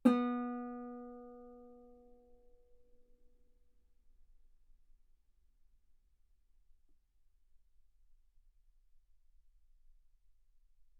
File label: Guitar B3; ff; sul B